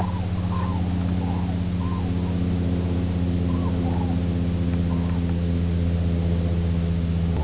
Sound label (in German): kranich flugzeug; Kraniche; Flugzeuglärm; das Schreien der Kraniche liegt oberhalb von 500 Hz